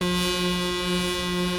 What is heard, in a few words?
truck beep; euro